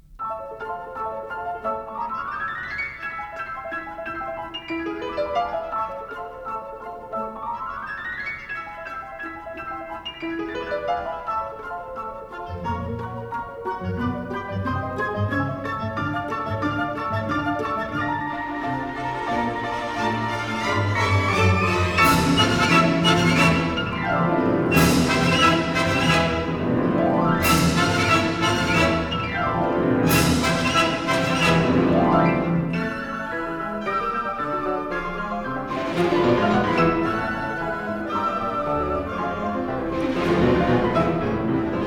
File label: Piano Concerto; Liszt; Katchen